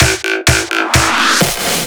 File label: filler tool 128 A; Anthemic